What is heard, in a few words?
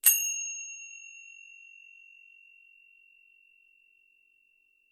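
tibetan cymbal contact sound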